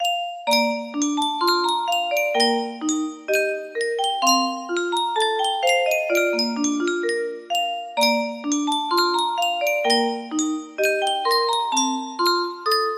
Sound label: music box, music box melody